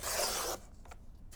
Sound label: Cut Paper, Paper Cutter; Micro Pack